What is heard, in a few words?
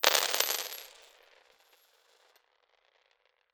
Spill On Floor, Medium Wine Glass